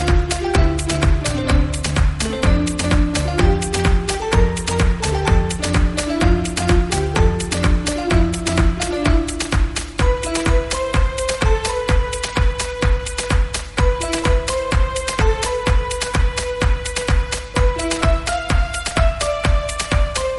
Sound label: english movie theme music tone ringtone; english ringtones